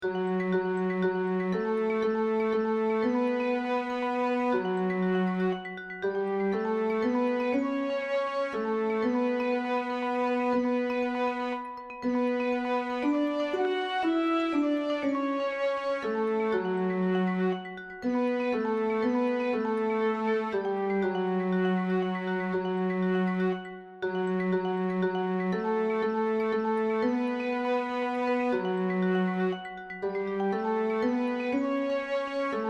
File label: Karaoke; saame 95